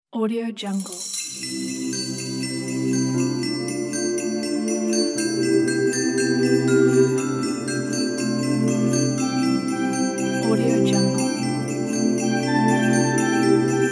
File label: happy christmas